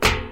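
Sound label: Jump Land Metal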